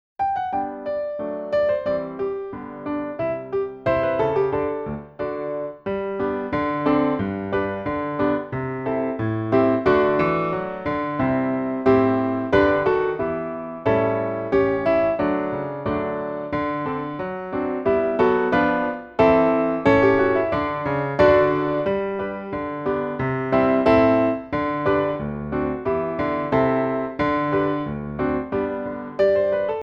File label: accomp; ye mariners